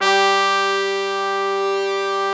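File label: BRASS G3 left, ORCH BRASS; Greytsounds VOL-1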